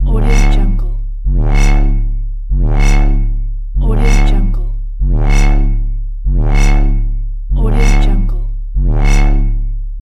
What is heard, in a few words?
radar